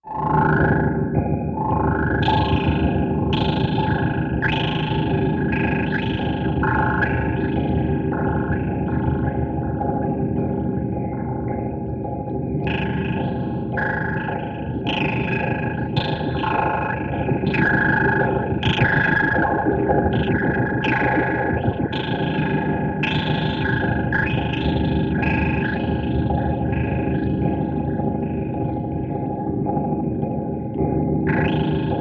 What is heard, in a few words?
Restless Roller Machi, sci fi ambience